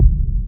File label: Kick; Samplepack Produtique